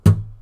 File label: Blop